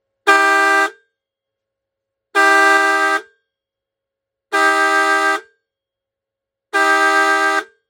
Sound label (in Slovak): fanfára 12v elektromagnetická 465 410mm duálna